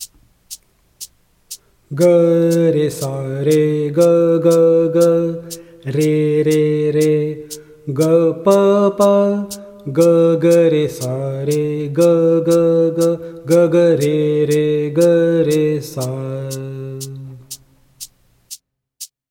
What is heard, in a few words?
Mary had a Little Lamb Vocal; Bansuri Student Zone